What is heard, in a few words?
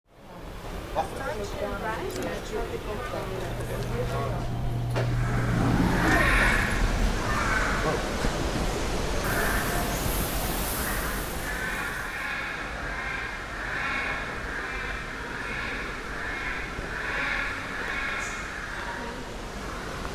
Bird